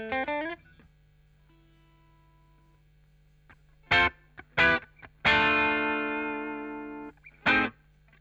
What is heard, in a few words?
Chorus Gtr